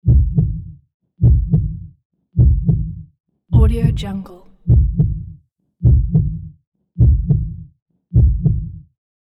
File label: heartbeat